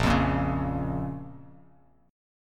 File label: Bb Minor; strummed; JGuitar